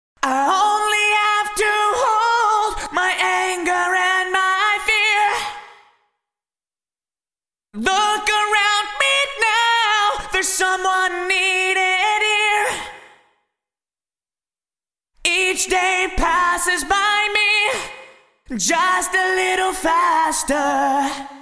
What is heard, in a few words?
Vocals Yamaha SPX2000 Rev X Chamber; Vintage Digital